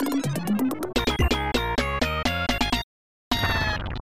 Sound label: Battle Start; Super Pachinko Taisen SNES